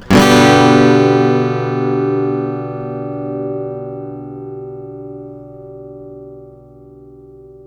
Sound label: One Shot Guitar - 125 BPM (G Major), Acoustic Guitar; Instruments; One Shots; GhostHack; Ghosthack - Free Guitar And Piano Samples